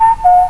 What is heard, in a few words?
Cuckoo; Glider PRO